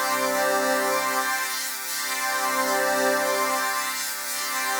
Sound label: Pad Loop C, shimmer and sparkle